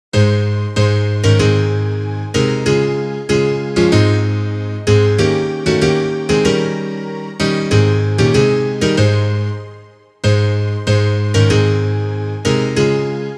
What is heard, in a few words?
instrumental